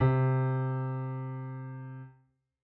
piano c; Golden Crayon Sounds

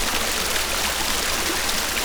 water fountain; Golden Crayon Sounds

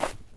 snow step; Golden Crayon Sounds

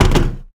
metal fall; Golden Crayon Sounds

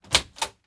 door open; Golden Crayon Sounds

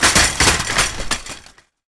bike fall; Golden Crayon Sounds

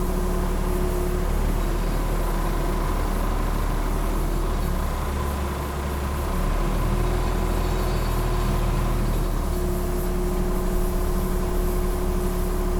air compressor; Golden Crayon Sounds

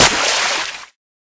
puddle fall; Golden Crayon Sounds

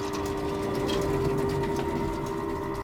elevator loop; Golden Crayon Sounds

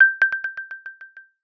Low Battery, AirPods Sounds, Case

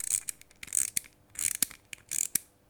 toy; Golden Crayon Sounds

